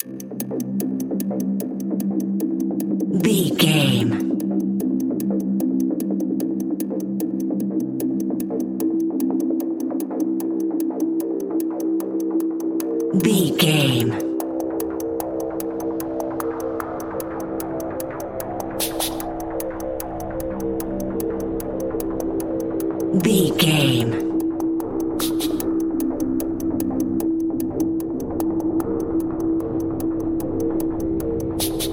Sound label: suspenseful ticking